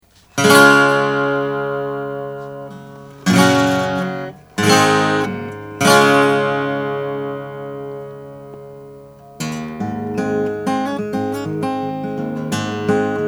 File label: gibson l 0 natural mahogany